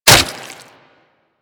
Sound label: Dagger Flesh Impact; Dual Daggers; Hit Effects; Weapons